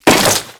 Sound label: Crewman Step Flesh, Footsteps; Warframe; Corpus